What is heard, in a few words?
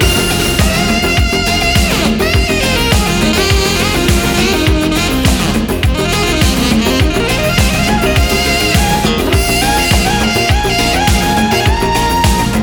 free spin loop; netent